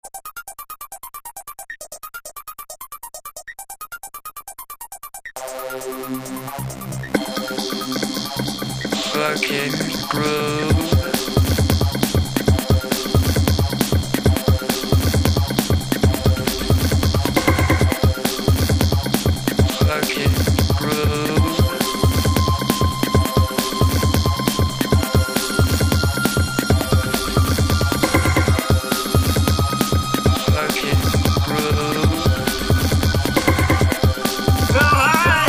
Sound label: Improvisation